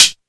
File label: muted high hat g key, Royality free closed hi hat sound tuned to the G note. Loudest frequency: 5453Hz; drum single hits one shots